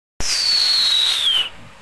silbidoooo

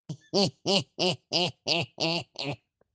risa; senor babidi

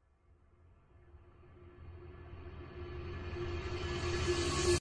sonido dramatico

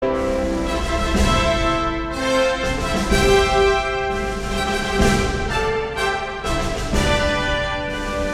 trompetas

